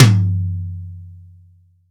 MIX2 MTOM, STUDIO TOM

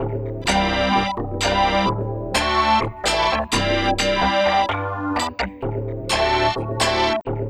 Latin Compo, Funk Keys; Sonic Foundry (Sony Creative Software); Synchro Funk

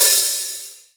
HH rock; EMU Orbit-3

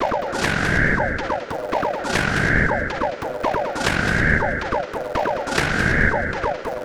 Machine SFX Loop; Electronic Point Blank

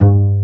pizzi total, stringed; East West; Global Sounds

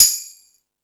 Discoball, Drum Machines E-MU Pro-Cussion

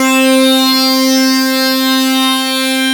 SYNC SWEEP

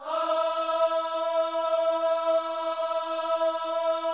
OHS E 4A, Gospel Ohs; Spectrasonics; Vocal Planet

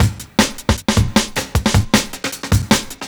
Drum Loops, 155; CruiseShip; M8; Electronisounds

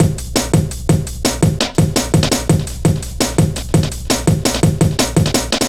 Step Ez, Drum Loops, Jungle, 168.5; Zero-G Jungle Warfare 1, 2 & 3

